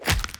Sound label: Death; Ion Shift